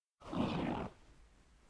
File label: shrimp Alive; customnpcs